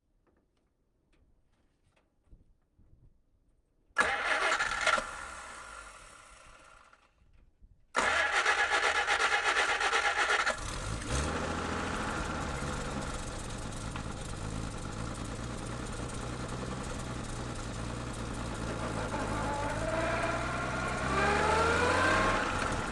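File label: onboard start slow drive stop reverse stop drive stop off exhaust below, Lublin 51; dpa4062; Sonniss GDC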